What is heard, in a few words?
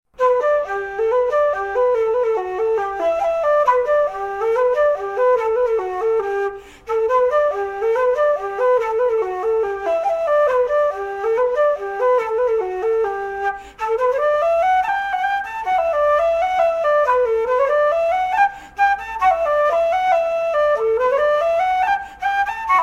Polka